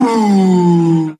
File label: ooh male shaming, Voice; ooh ahh